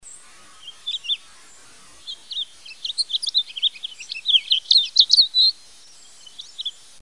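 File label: House Finch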